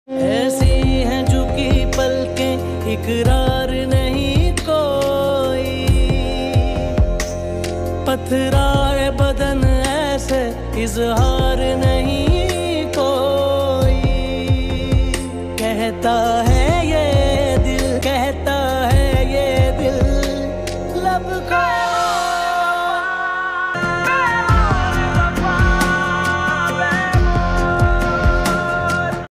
OST pakistani ringtone

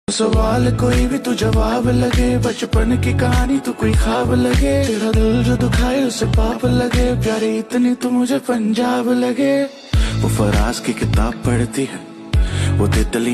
hindi ringtone

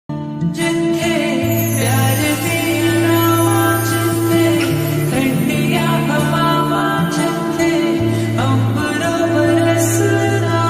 lofi hindi rap ringtone

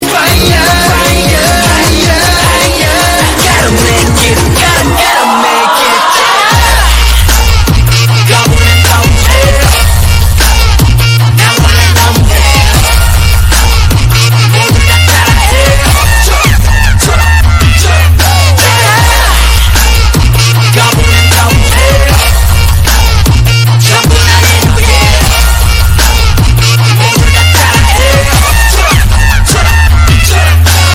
korean ringtone

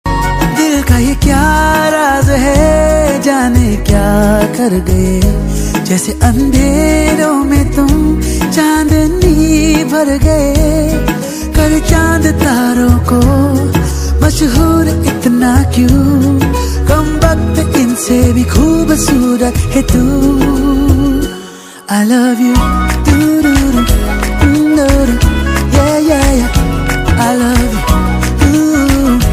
hindi ringtone

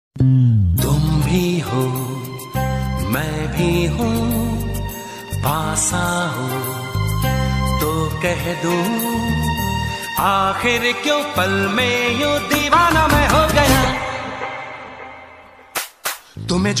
male hindi ringtone

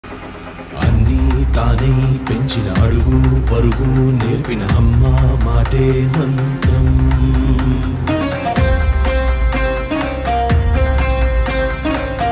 telugu ringtone; Rockey bhai

